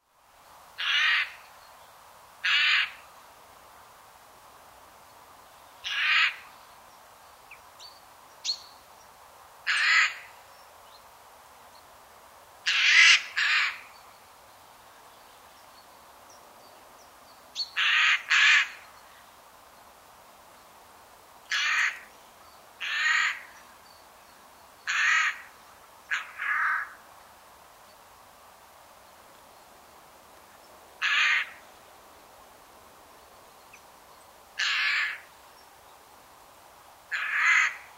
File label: Garrulus glandarius; SETE